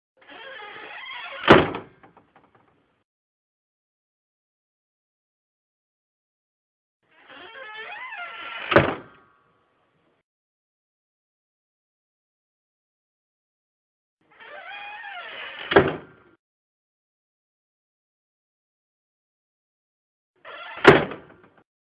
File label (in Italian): Suono Porta sbattuta; Effetto suono